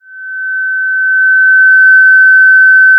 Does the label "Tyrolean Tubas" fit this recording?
No